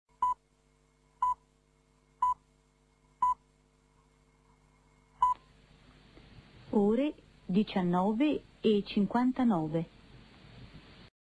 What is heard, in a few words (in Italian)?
Segnale orario